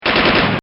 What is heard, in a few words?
Machine Gun-Single And Double Shots; Military; Bobby Cole